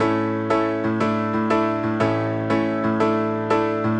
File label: Pop Piano